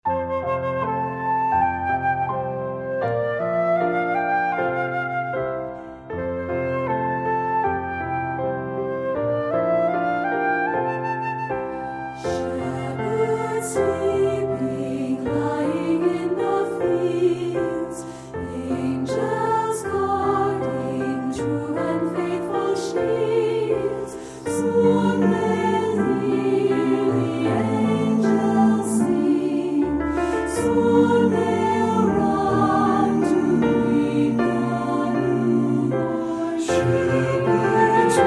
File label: shepherds carol